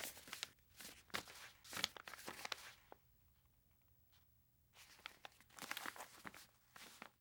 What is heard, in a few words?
Paper Envelope Movement; Fairlight Sound Lib